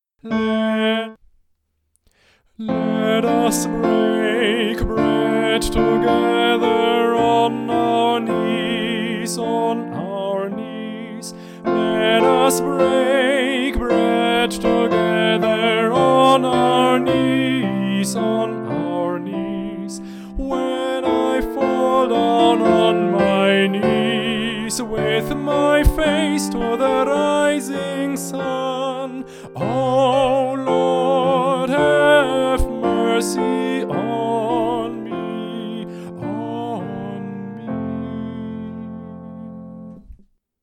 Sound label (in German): Tenor mit Klavier Tutti